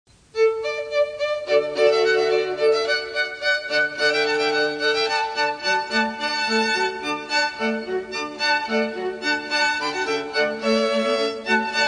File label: La Rejouissance, Classical; Handel